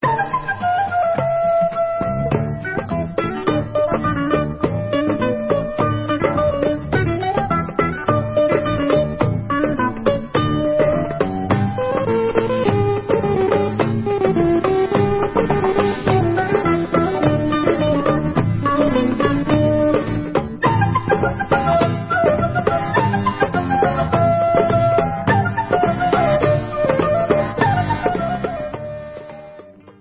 Debka Instrumental; Debka Ayil

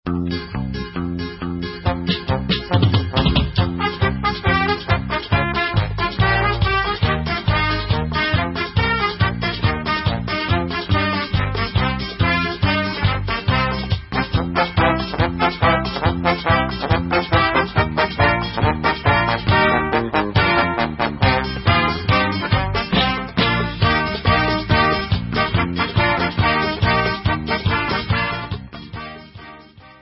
Instrumental

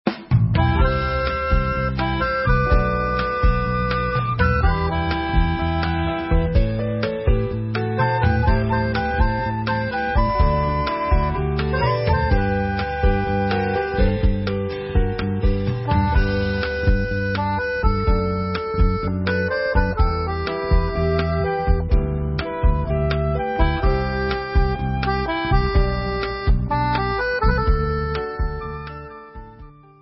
Instrumental

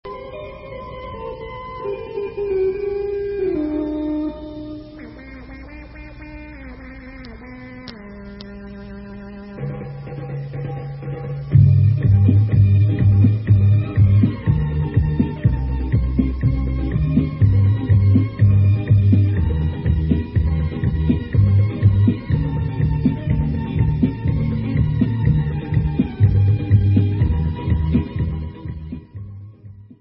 Debka Instrumental

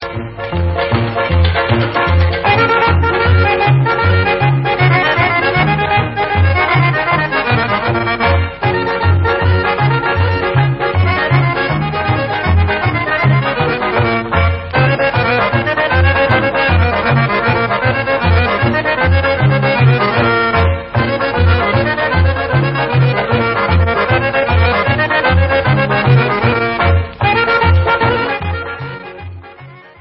Greek Instrumental

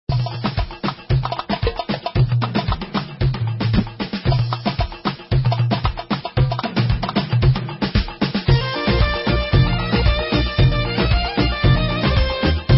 Debka Instumental; Debka Eileen

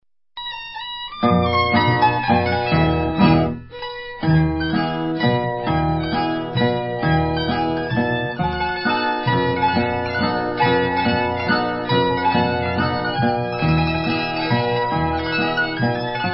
Polish Instrumental

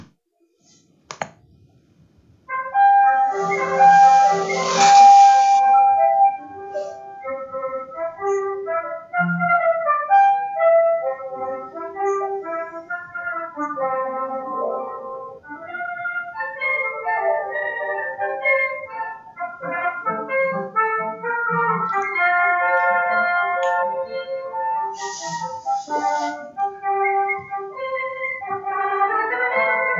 folk instrumental

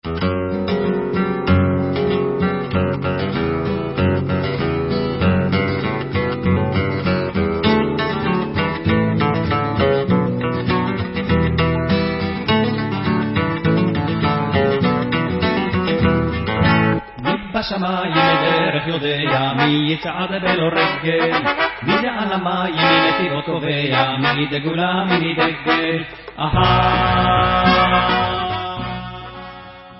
Mechol Hamitpachat; Bentzi Tiram 1976

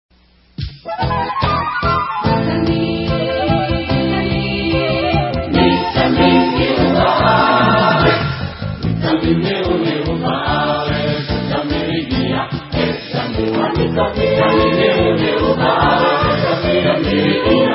Vocal

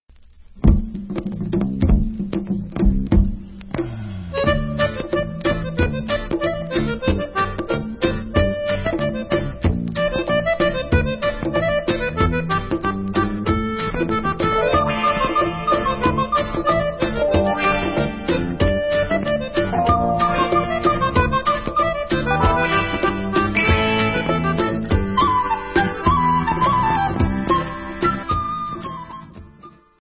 Debka Instrumental

